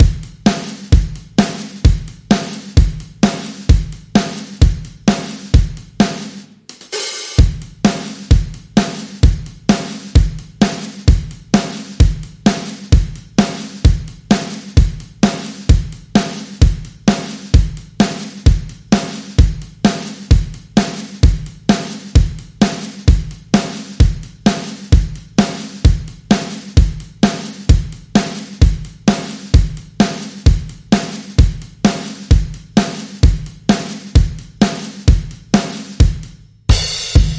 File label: Drums